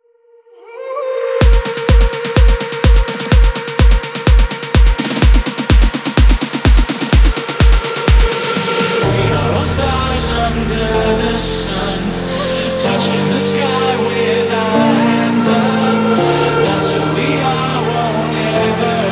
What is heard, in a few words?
EDM